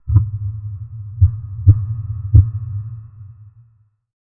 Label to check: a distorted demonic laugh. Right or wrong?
Right